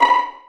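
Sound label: Vio pizzicato B4; Digital Sound Factory Virtuoso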